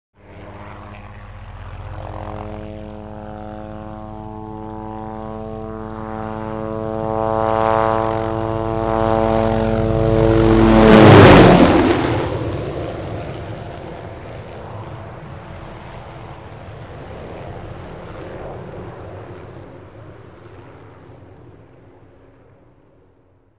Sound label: Helicopter flies fast